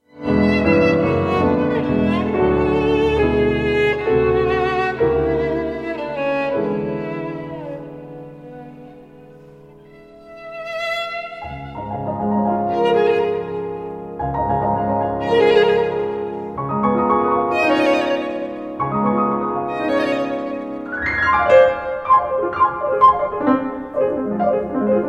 sonata no 1 in d minor for violin and piano 3rd movement rondo allegro